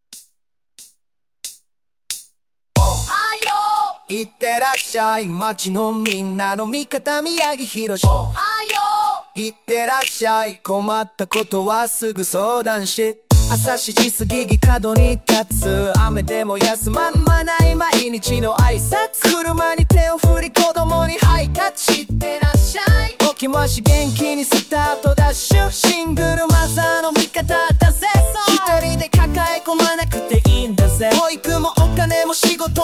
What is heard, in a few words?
theme rap